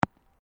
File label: Sport Ball Rubber Bounce Gravel, Rubber ball; Bounces; Objects